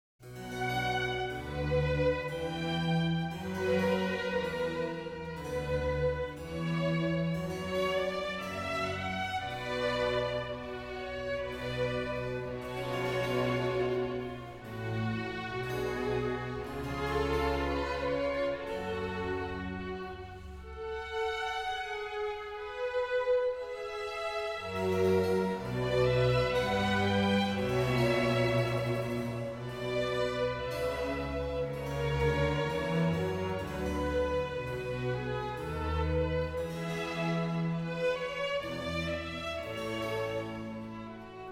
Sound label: Concerto for Trumpet, Strings and Continuo Grave; Telemann; Trompetenkonzerte